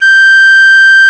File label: Piccolo, g6; BGM; Nintendo; July 2020 Gigaleak